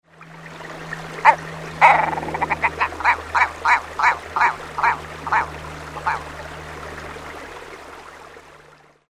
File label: red grouse